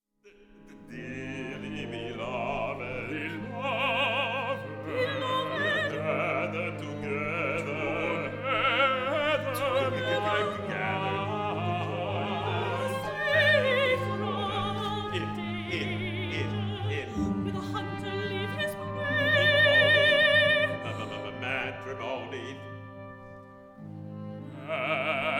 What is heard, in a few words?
Wedding Quartet excerpt